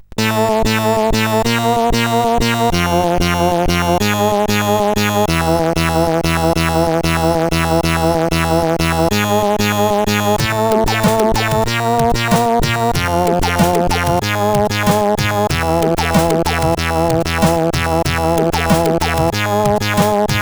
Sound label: Hardcore, CSG8580R5